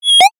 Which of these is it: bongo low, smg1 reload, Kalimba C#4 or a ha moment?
a ha moment